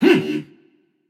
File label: grunt attack, voicepack; smite; jorm